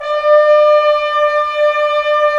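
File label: brass trombones; Roland LA Composer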